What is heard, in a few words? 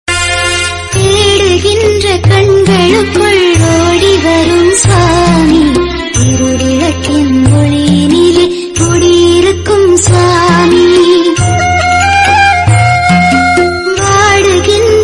Tamil Bhakti Ringtone